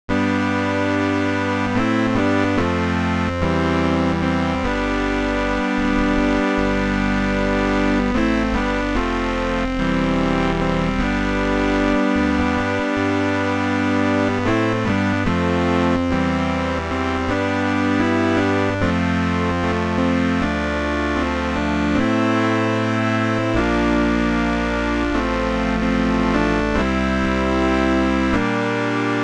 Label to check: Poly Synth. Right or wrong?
Right